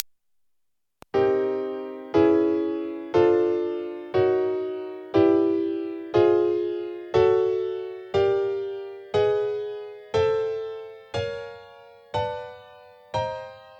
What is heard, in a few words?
chd m7